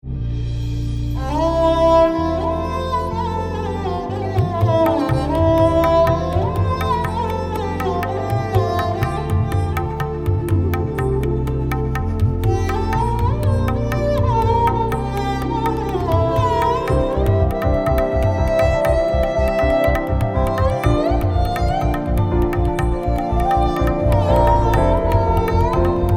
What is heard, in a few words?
sarangi; Feed Your Soul Music